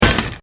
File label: shield block; Minecraft